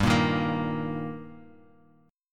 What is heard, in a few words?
G Minor Sharp 5th G, chord; strummed; JGuitar